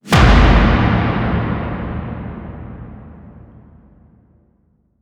Impact; FX; 99Sounds; Monosounds

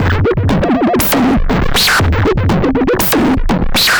Anabeat Mix, Beats

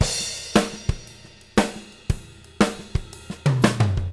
Living Drums; Sampleheads; Peter Erskine

